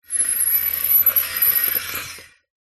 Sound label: foley metal sharp scrape squeak shrill